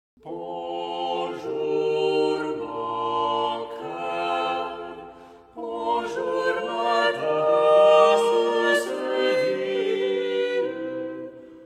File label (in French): Chanson Bonjour mon coeur; Lasso; Renacimiento